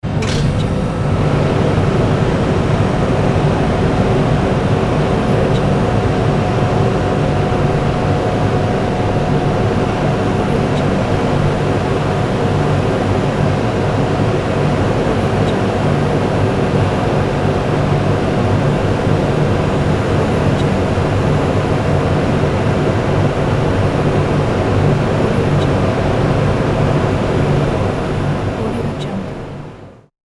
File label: machinery room